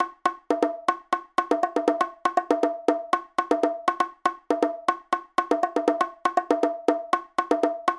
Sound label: World Bongo